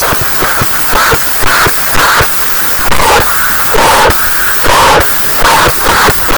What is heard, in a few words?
alarm